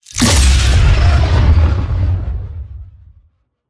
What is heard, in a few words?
Banshee Missile Launch; Evolve; Elder Kraken